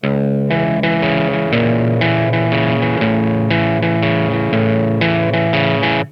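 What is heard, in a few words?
Distorted Riff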